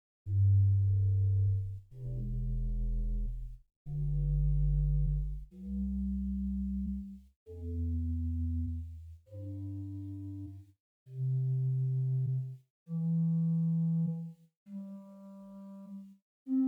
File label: Gt Rohrflute16 arp, organstops